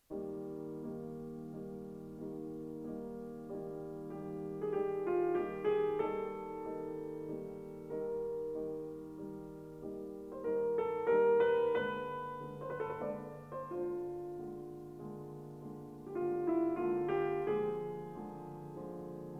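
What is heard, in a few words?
Piano Sonata No. 11 in B flat major; Wilhelm Kempff; Beethoven; Piano Sonatas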